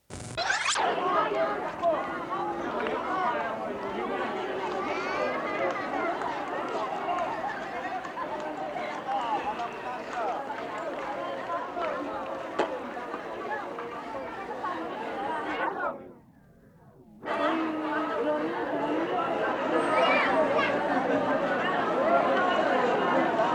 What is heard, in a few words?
Outdoor Crowd